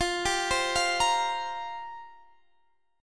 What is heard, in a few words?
F Suspended 2nd Suspended 4th, Guitar DADGAD